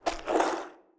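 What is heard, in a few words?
Zombie Footsteps; Blind Survivor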